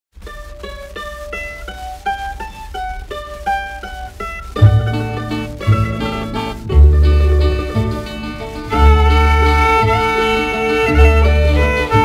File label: cowboy waltz